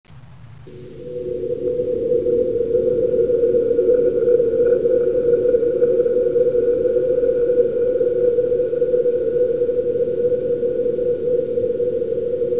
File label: forest; musics; aomdc